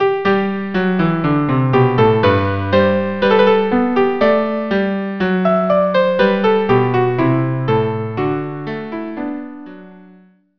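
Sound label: viola, cello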